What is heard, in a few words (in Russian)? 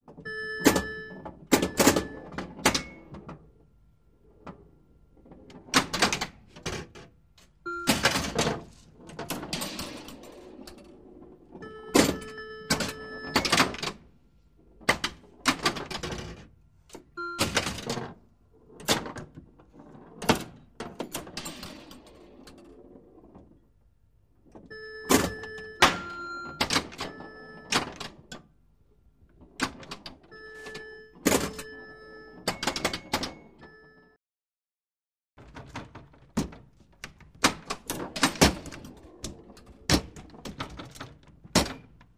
zvuk pinbolnogo avtomata